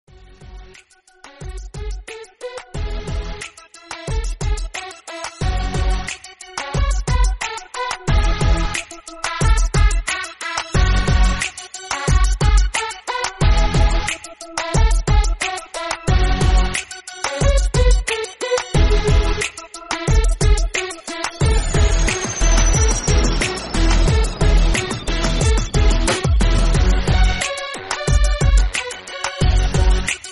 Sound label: trance; Religious